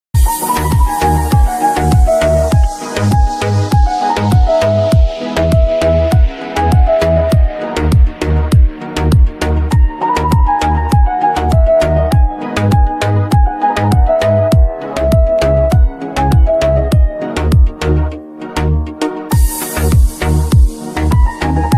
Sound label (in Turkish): Telefon Zil Sesleri